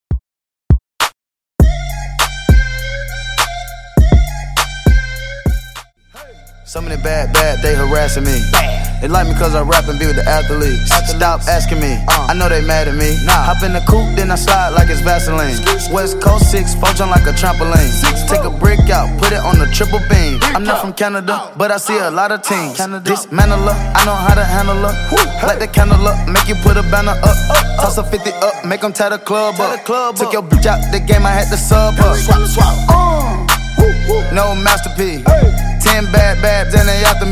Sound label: Extended Redrum